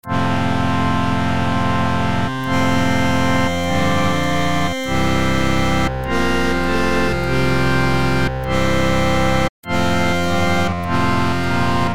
Harmonium; Jubilate Deo; Syntheway; Harmodion

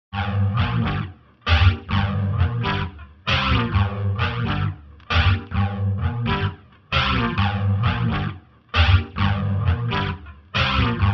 Chorus Bubbler Vibes Guitar Wet; Vox Quantizer; syntheway

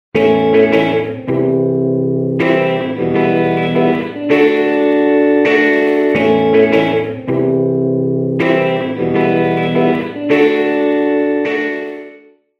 Tube Driven Reverb, Wet; Virtual Room Reverb; Syntheway

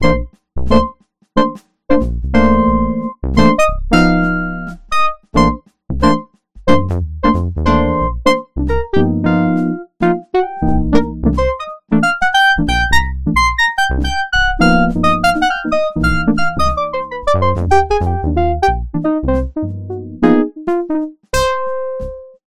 KEYS Phat Fusion; SpheratorFM; syntheway

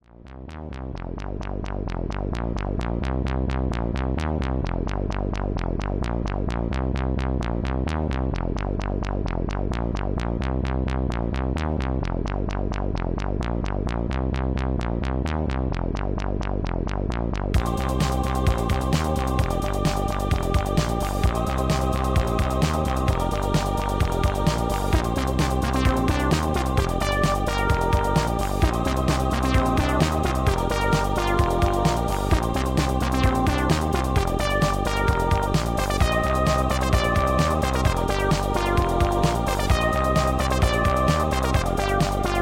Roland Funk Bass Choir; VST; Midnight Express; Bassesland; Roland Juicy Funk Bass; Magnus Choir; Syntheway

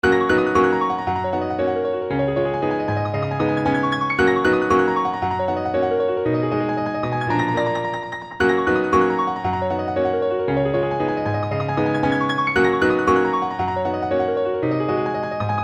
Steinway Grand Piano; Etude Op.10 No.5 in G-flat major Black Key; Frederic Chopin; Syntheway

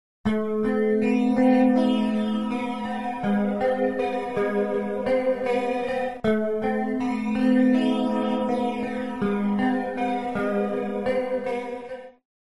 Rotary Speaker Swirling Overdrive Guitar Wet; AmpVibe; Syntheway

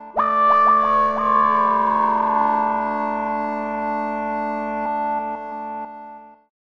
Cavern Atmos; Waverator Spectra

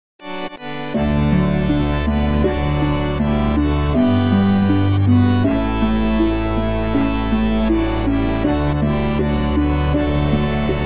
Accordion, Harp, Strings, Piano; Syntheway

